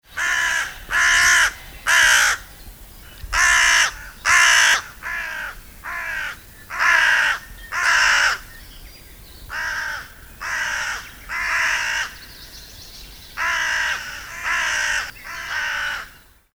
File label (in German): Nebelkraehe Rufe